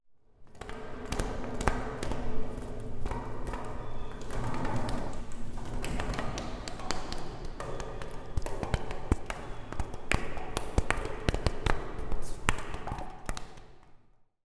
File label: Rail Rhythm